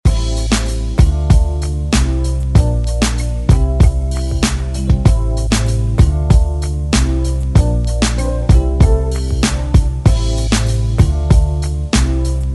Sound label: DJ Intro Outro Hype Edit 96 Bpm; DJDOLLS